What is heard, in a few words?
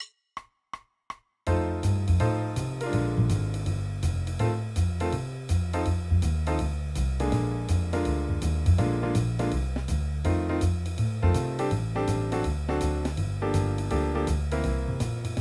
Melody Instruments